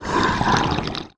moan; The Elder Scrolls III - Morrowind

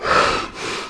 moan; The Elder Scrolls III - Morrowind

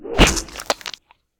deep ooze slam; SyrinScape; East Ward

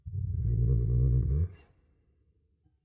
Shadow giant grows and grumbles; SyrinScape SoundsScape Collection; bbh Haunting part 1; TTRPG

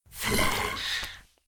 Ghoul Growl; SyrinScape; East Ward

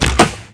disarm; The Elder Scrolls III - Morrowind

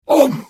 Hit; The Elder Scrolls III - Morrowind

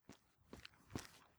traveler walkin; Papers Please

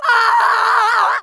scrm

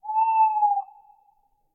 Tawny Owl; SyrinScape

